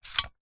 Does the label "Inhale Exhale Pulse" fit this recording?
No